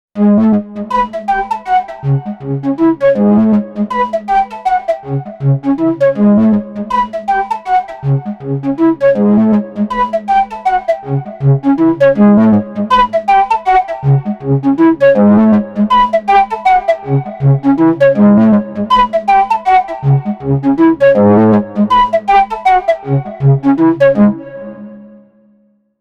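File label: Floot, synthesizer; Sequential Pro 3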